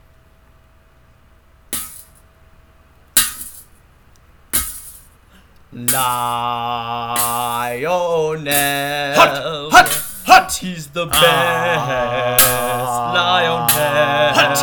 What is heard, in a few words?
chant